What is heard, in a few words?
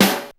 DR550 RIM S; 90 sSampleCDs; Crypton - DrumBase5000